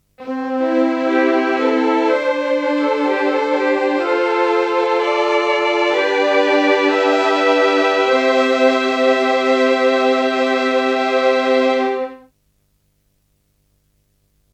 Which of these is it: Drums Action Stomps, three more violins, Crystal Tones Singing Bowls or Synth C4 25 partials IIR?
three more violins